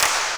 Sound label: clap, hip; Best Service; Dance Mega Drums